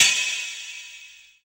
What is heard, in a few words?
FX Dance